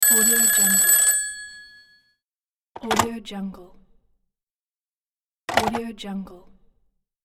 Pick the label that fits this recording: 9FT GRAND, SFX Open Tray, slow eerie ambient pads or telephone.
telephone